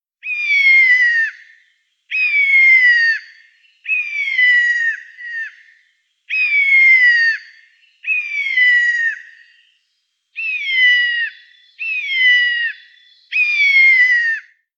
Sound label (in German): eichelhaeher, vogelstimmen